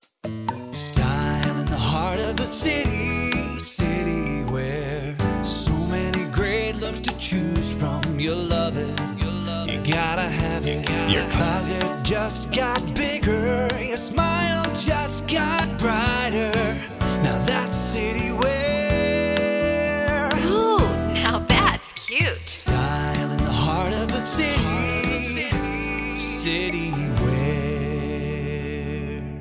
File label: clothing store jingles; CITY WEAR